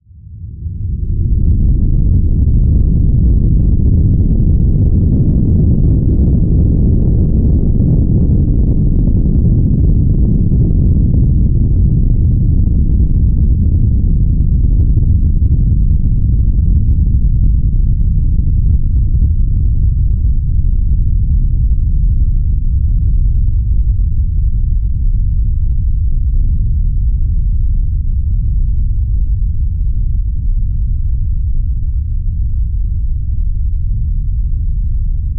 transport space shuttle launch from distance rumble